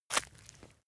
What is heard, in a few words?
leather impact mud soft squelch